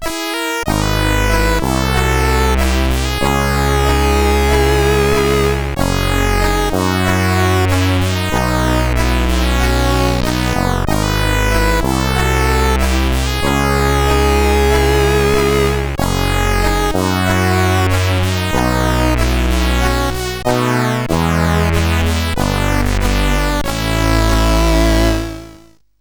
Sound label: Stay Down, CSG8580R5; sid